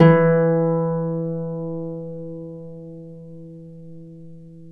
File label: Celtic Harp, Plucked Strings